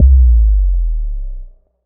Single Electric Basses, Dance Floor Bass

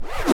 SCRATCHES

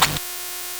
LSDj, Nintendo Gameboy; 300 Drum Machines

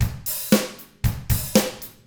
ROCK

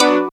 syn b, Dance Synths Stabs & Hooks; Best Service ProSamples vol.37

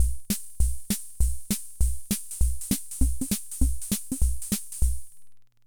Hammond Auto-Vari 64 Loop, drum machine

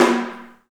HAIRCUTS, KENYA DRM, Heart of Africa

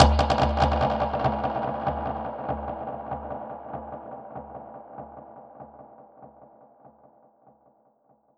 Perc Hit, dub percussion; musicradar

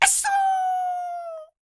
YELP, World Voices, ANDES FX

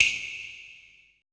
SNAP; BPC PERC

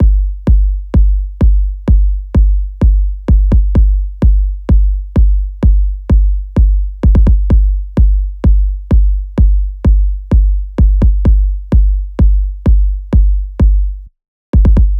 loop kick 128; Seismic Loops

